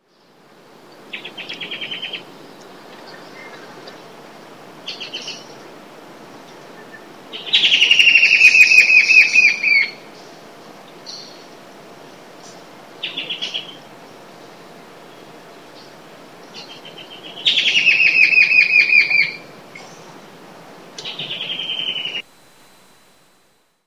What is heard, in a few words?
Dendroplex fuscus; Comandatuba Island; Brazil